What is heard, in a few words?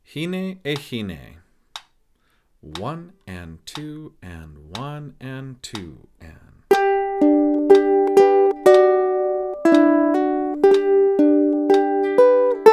ukulele